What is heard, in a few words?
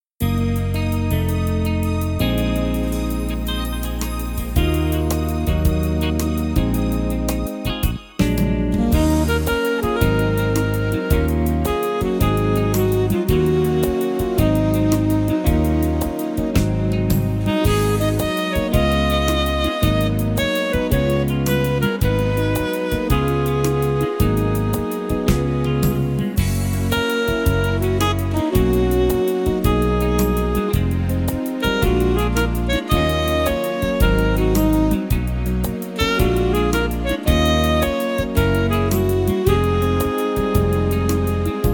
Random Pan Clarinet